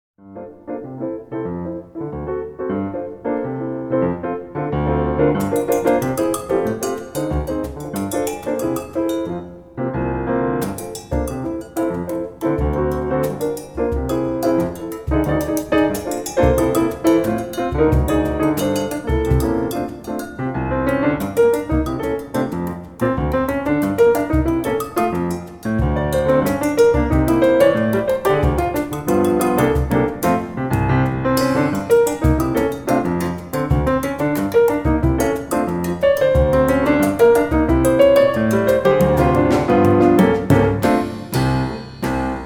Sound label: Samba